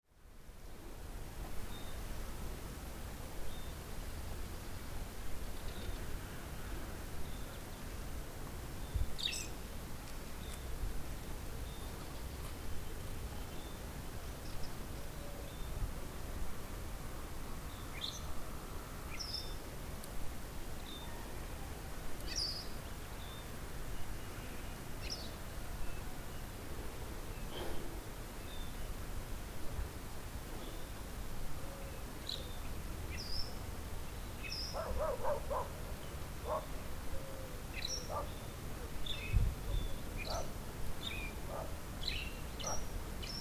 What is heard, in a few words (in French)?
nefta bassin eau; resonances oasiennes